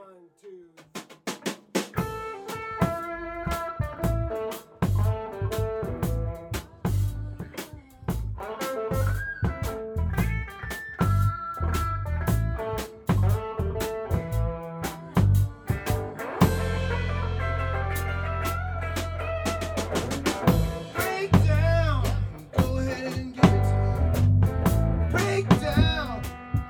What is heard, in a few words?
Breakdown gtr